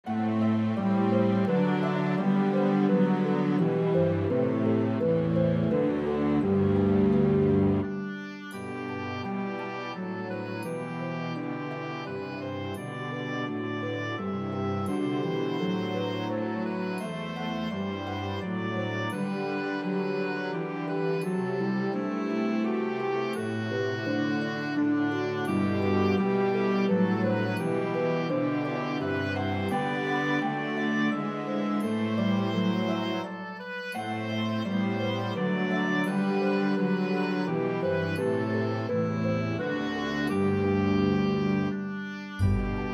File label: Oboe Track with Melody; Away in a Manger